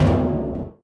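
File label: panels impact small, metal; physics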